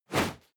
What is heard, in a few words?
whoosh classic; swooshes-swishes